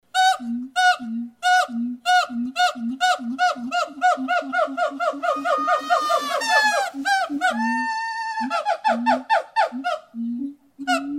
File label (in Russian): Orangutany